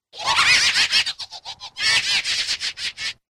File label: laugh